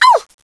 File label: pain; cstrike